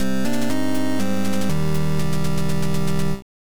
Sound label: Lose Life; RoboCop Versus the Terminator; NES